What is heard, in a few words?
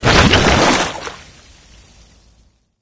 laser lifts goo down